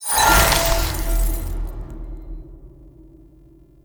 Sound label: Shard Upgrade Removed; Mythic; Warframe; Helminth Shards